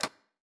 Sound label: Rotate Button